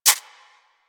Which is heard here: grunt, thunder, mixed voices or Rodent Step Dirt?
Rodent Step Dirt